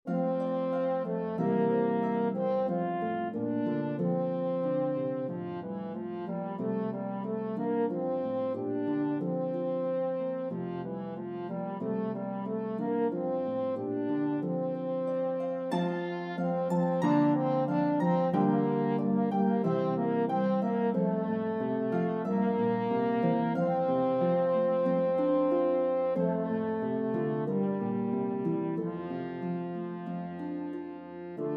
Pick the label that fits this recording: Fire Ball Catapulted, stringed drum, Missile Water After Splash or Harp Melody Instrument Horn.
Harp Melody Instrument Horn